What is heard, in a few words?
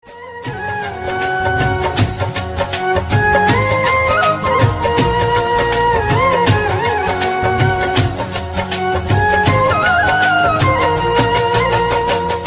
flute ringtone